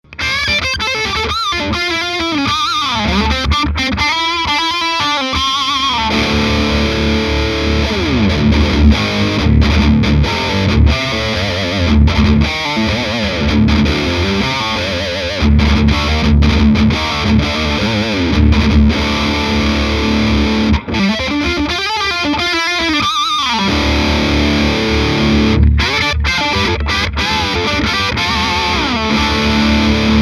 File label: reaper dual recto